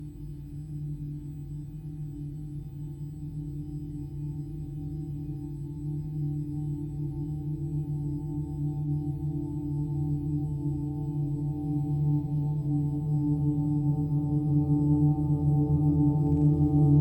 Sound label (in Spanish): Rev Sonidos Guit